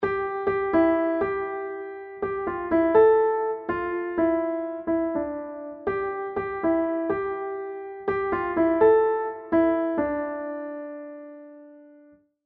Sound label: Piano Melody